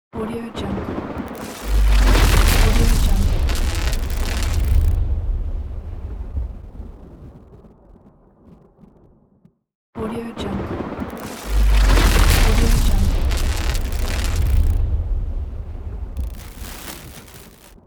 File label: thunder